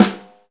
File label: BRASS, DRUMS; Best Service; Alpine Volksmusik